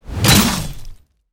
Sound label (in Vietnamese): tieng riu chem vao ke thu ke dich